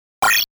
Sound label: 8bit; mariobr